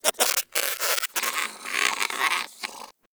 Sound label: Rodent Vocal Tranquillized; Warframe; Hunting Critters; Characters